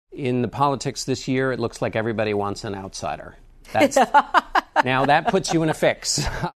LAUGH; hillary; OUTSIDER

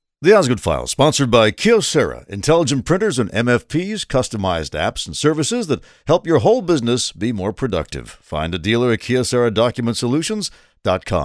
ORGAN

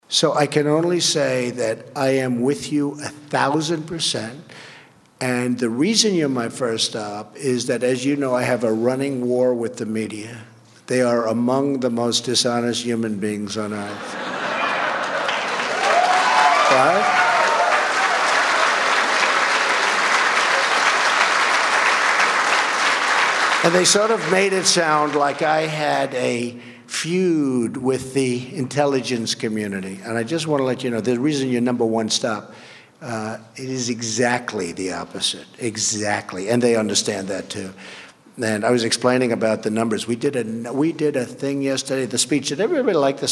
CROWD; trump cia 1000 PERCENT

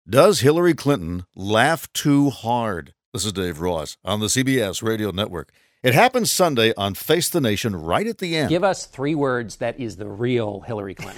LAUGH

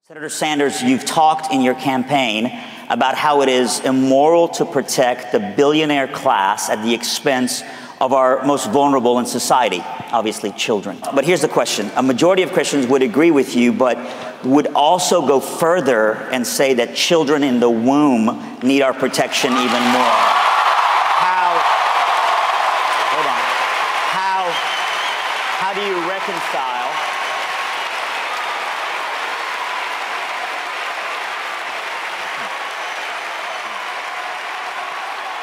BIG APPLAUSE; sanders; FOR ABORTION QUESTION

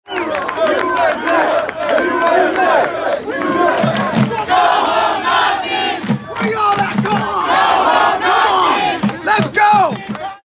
dueling portland chants

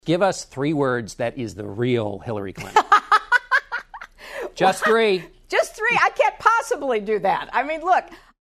LAUGH; hillary; GIVE US THREE WORDS

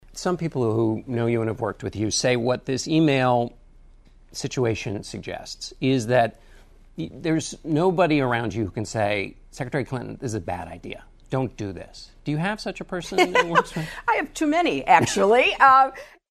LAUGH; hillary; on emails

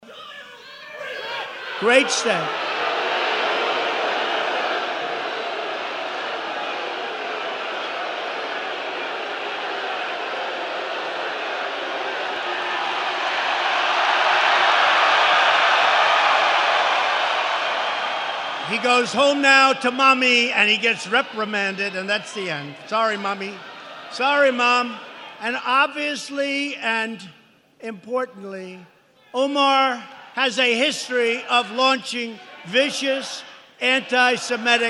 HECKLER BOOS CHANT; trump

